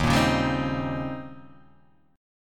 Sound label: D sharp 13th, Guitar, Half Step Down